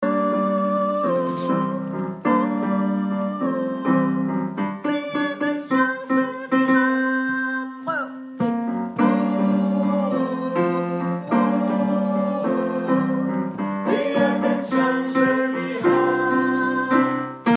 uh uh 2 gang tenor

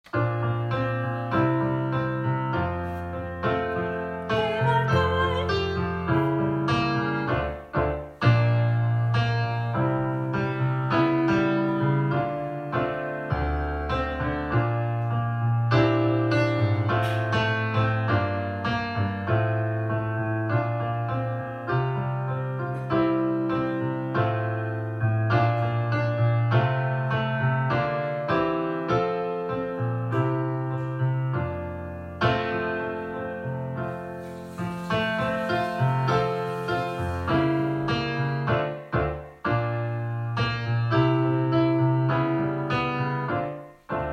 dine Øjne klaverakkompagnement

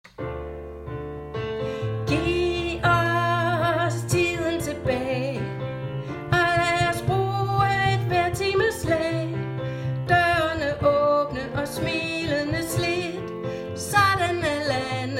2 stemme omkvæd 2 gange

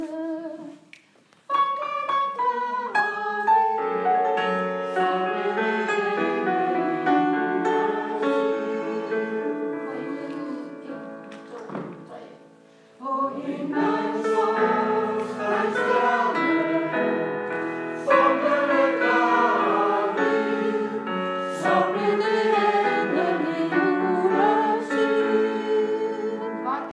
sopran og tenor

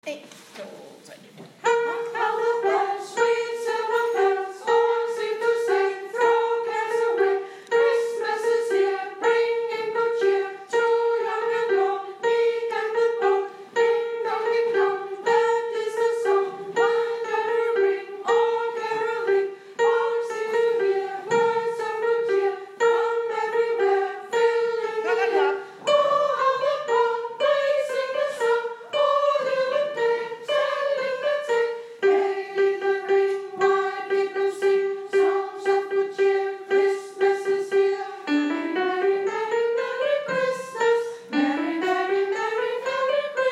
carol of the bell sopran

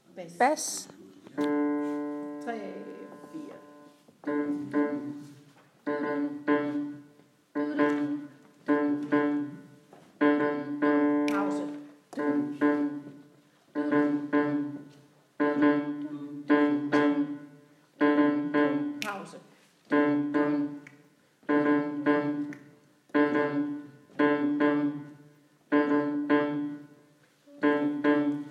gøta bas

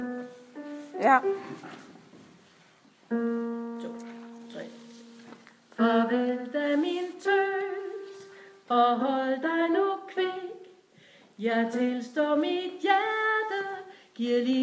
tenor

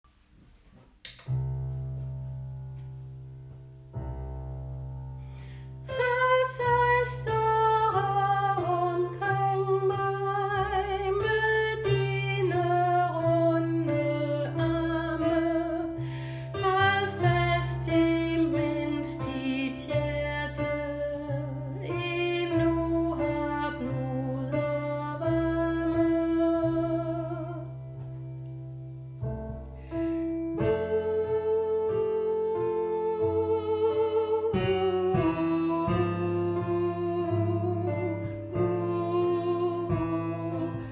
aftenstunden angst tenor

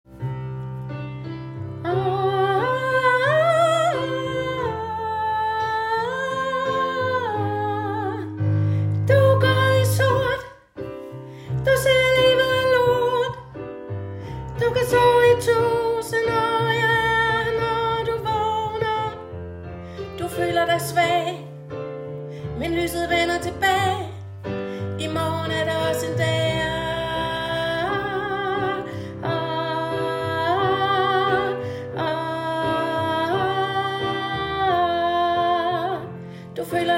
i morgen er der også en dag 2. sopran omkvæd

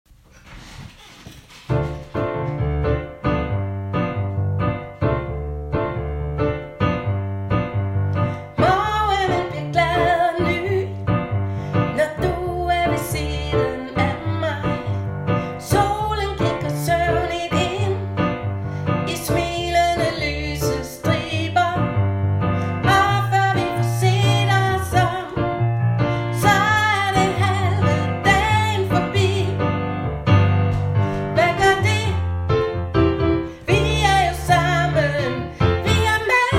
sopran bas

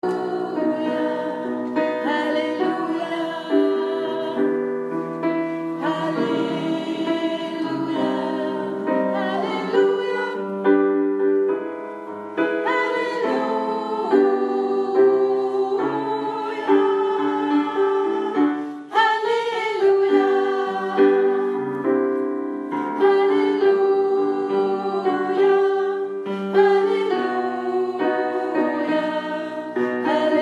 halleluja sopran; 2. sopran

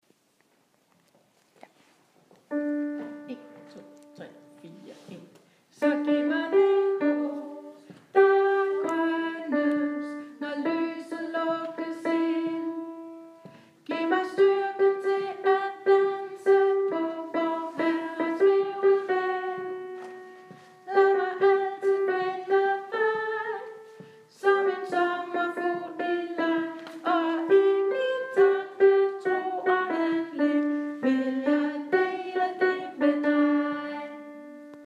når håbet grønnes 2 sopran

